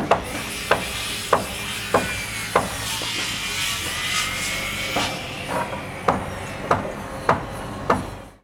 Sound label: build; Quentin C's Gameroom